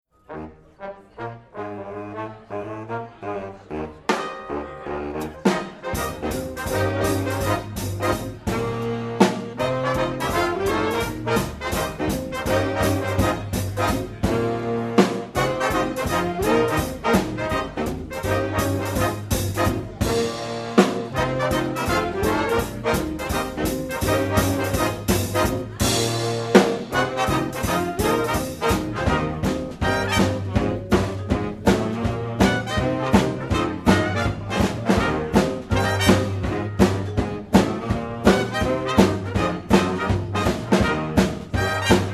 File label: Swing Orchestra